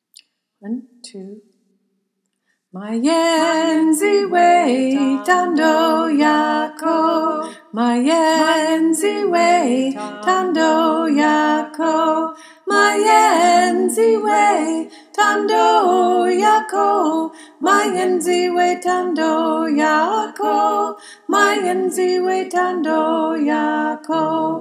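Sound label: soprano, choir; freedom choir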